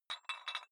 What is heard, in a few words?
dumbbell take off rattling weight, fitnessstudio